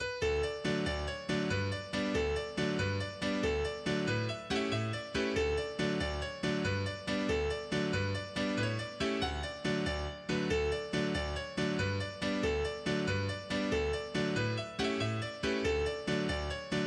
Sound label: Jig Of Slurs